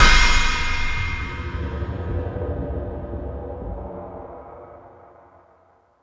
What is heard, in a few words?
Metal Drums, E-MU Pro-Cussion; drum machine